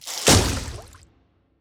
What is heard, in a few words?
Ballon Special; ArmyRaid; Interface and Item Sounds